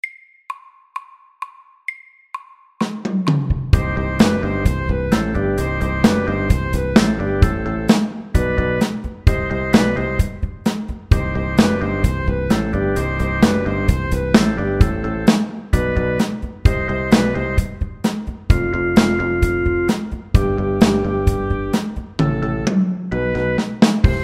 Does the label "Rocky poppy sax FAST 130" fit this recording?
Yes